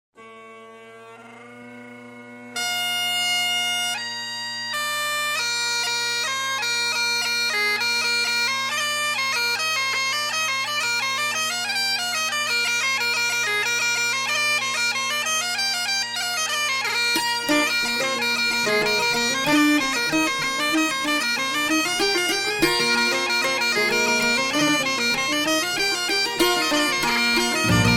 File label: jig